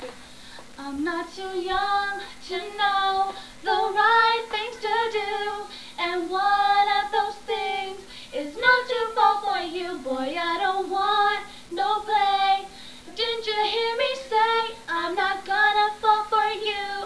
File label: accapella; Singing A capella